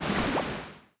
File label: Water; The Denpa Men; Android